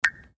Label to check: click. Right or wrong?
Right